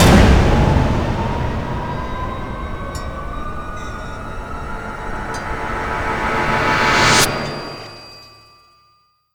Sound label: Death